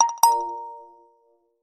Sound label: New Nokia Message